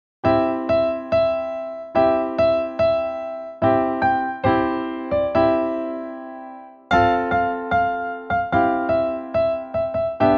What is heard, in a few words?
Jingle Bells